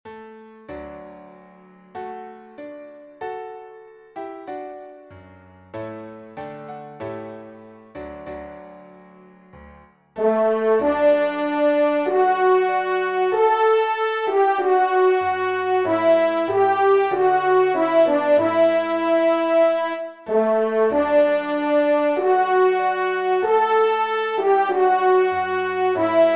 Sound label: Laudate Dominum; Gounod